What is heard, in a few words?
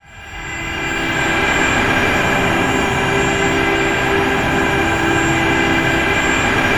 ATM TONAL; Best Service - XXL Production Effects